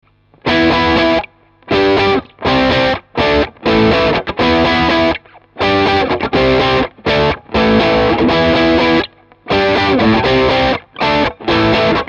Strat Am Pro hard medio

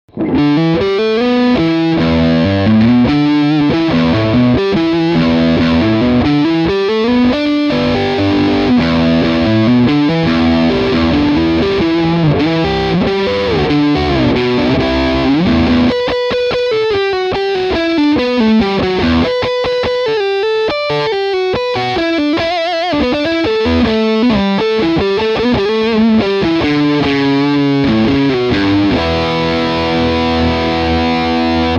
Strat Am Pro Metal medio mastil

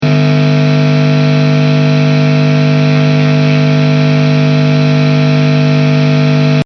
Ruido hx Bypass

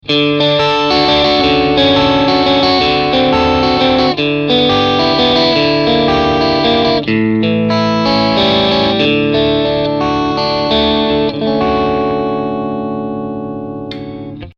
Strat Am Pro Overdrive medio mastil

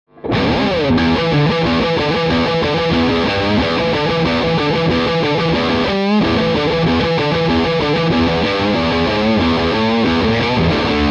Strat Am Pro Metal mastil

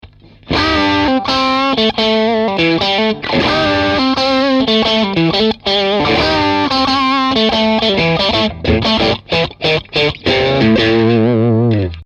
Strat Am Pro Dist puente medio

